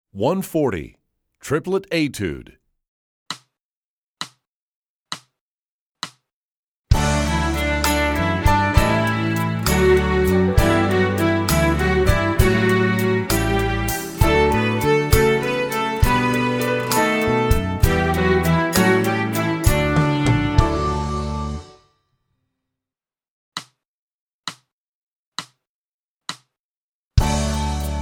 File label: Triplet Etude, strings; EE2000 Book2